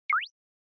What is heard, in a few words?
Onoma Negative High